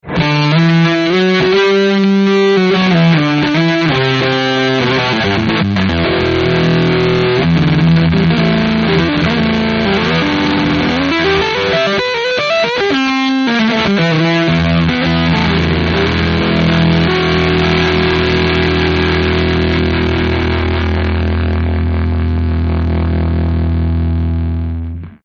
fat germ fuzz

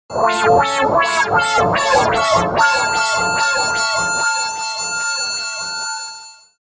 ME-50 Res Wah Harm; BOSME50

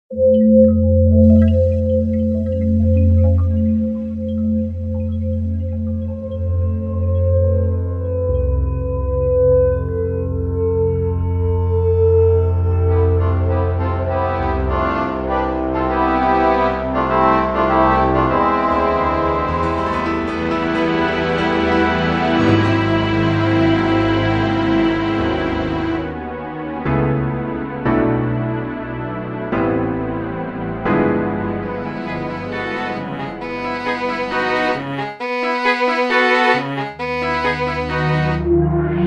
Film Orchestra

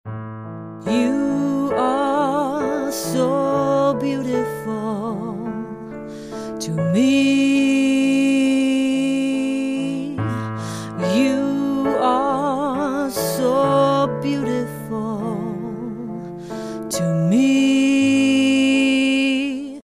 Beautifully Bad

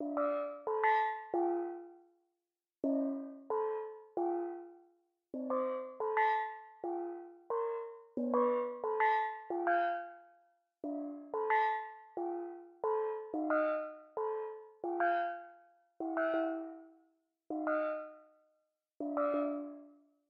Like a Steel Drum

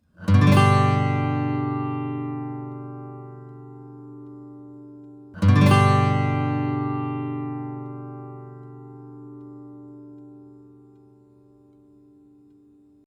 Double Strum

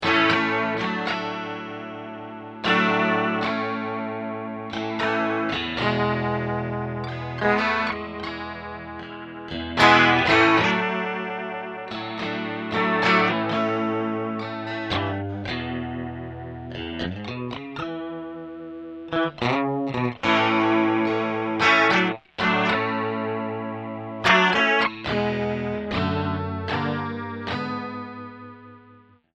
Memory vib comp

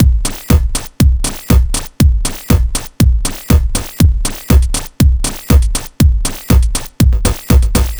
drum loop; ultimate elec 2

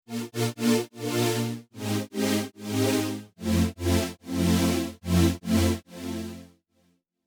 Tremo

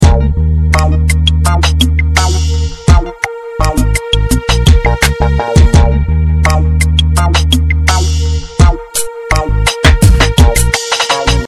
Long Night 84 bpm

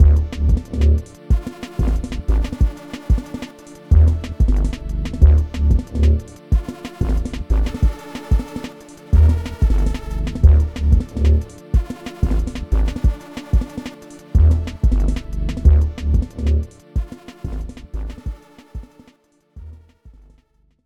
DnB noise

